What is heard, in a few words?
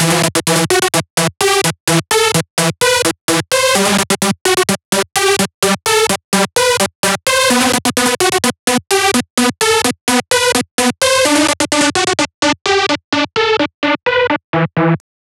Synth Loop future rave emin 128